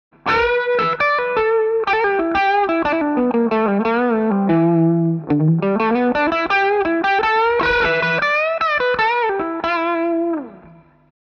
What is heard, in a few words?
GA 47 Cardioid Crunch Solo